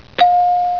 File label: answer